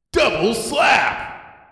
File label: double slap